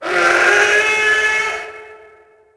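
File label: Howl Female, creatures; Tusken; Star Wars Obi-Wan; XBOX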